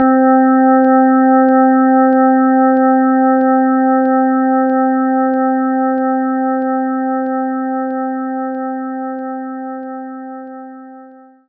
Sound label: DX7 Wave